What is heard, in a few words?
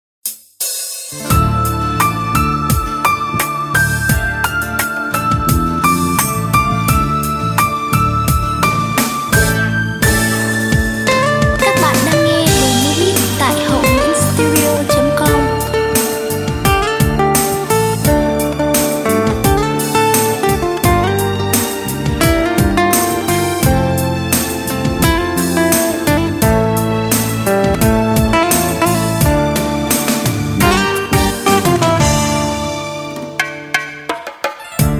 beat, playback